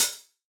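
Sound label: hi-hat Half Open, Yamaha DJX 2B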